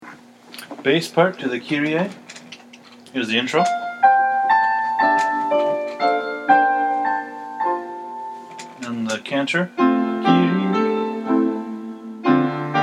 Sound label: bass; kyrie mass xvi